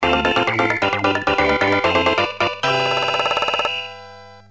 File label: victory; super mario kart